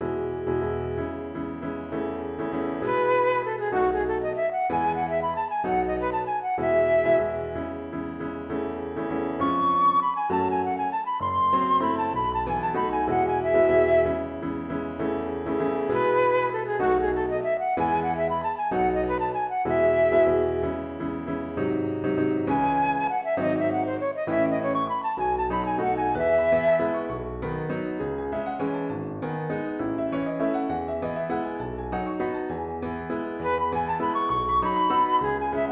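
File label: sonatine pour flûte piano; ant guillaume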